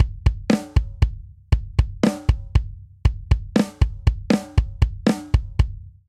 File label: Straight Three Rock Breakdown 3/4 118 bpm; MIDI Clips; Drum; Ableton; Factory Packs; Drum Booth